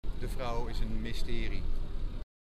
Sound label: loop